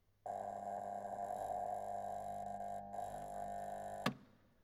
Industrial Mechanical Electric Motor Arcing Then Trips Out Trip Switch